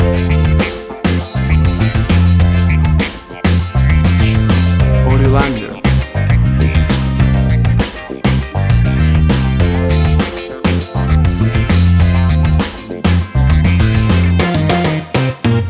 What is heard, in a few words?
70s Funk Band Music Theme